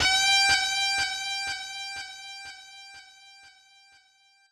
bend G6

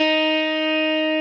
Arp. x 4

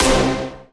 punch C5

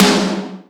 normal hit clap

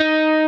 Arp.

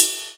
soft hit normal

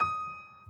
piano sus

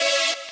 saw

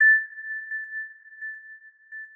mid chime

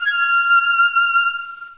pipe

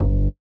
bass G2

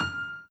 Piano Chord

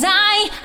vocal

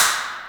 electronic; osu

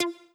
soft hitnormal

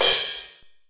Crash close

